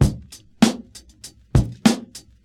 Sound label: 98 bpm drum beat c sharp key